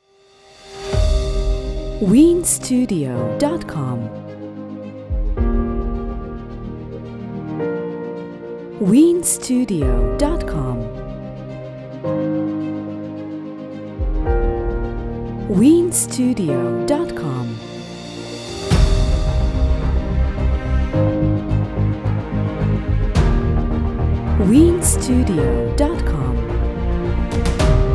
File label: corporate upbeat